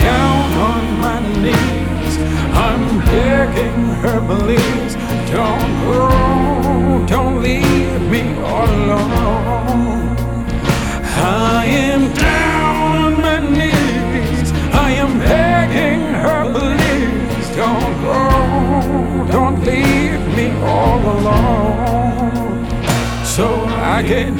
Alt Rock

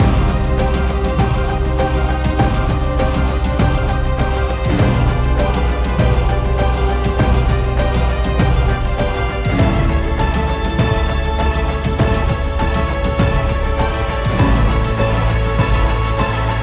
Library Fantasy; Audio Animals

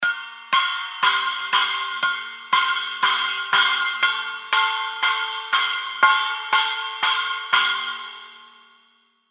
Zildjian ZBT 8 Inch Splash Cymbals

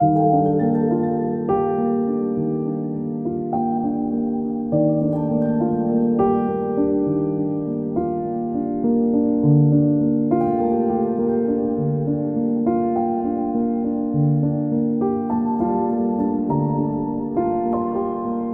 Library Chilled

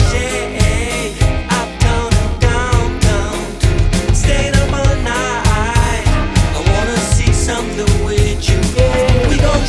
Alt Rock Original

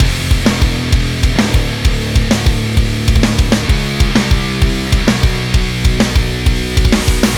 Metal

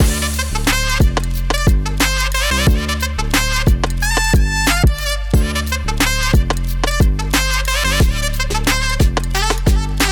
Hip Hop

